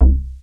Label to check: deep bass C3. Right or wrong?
Right